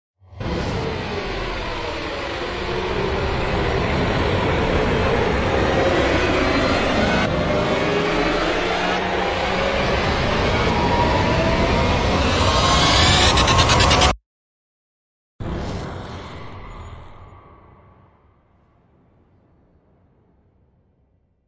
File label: Revolver Rise Only; Ninja Tracks